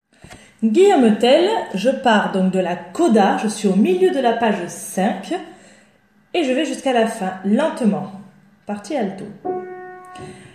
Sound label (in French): Alto; Coda; Guillaume Tell